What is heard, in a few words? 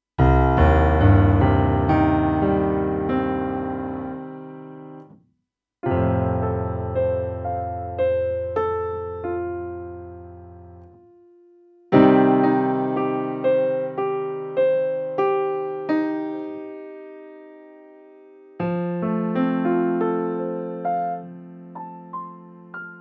piano; Neve 88M